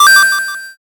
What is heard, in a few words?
coin